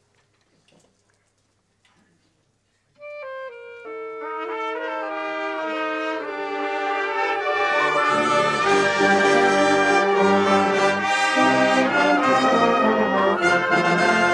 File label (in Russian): Духовой оркестр